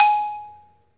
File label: 3D MARIMBA; 3D Sound; 3D Keys VOL-1